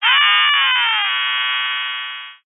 science fiction radio receiver transmission interference burst whine rasp